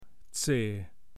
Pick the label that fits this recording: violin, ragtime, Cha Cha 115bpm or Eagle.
Eagle